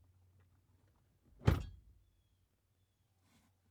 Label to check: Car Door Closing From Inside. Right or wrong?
Right